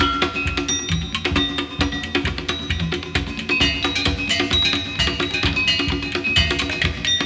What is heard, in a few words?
Basis 132, Percussion Combos, Abstract World Fusion; M-Audio; Pro Sessions VOL-38